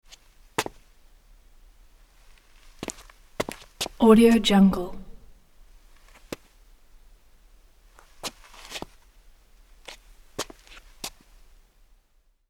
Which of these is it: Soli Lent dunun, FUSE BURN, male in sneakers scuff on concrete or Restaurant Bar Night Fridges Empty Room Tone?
male in sneakers scuff on concrete